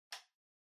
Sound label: Calculator (Far Switch)